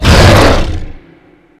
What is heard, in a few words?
shout short